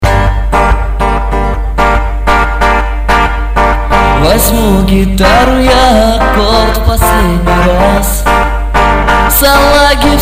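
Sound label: songs to guitar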